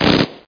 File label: Heavy Bullets; The War Engine